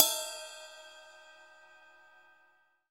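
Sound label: Cymbal Ride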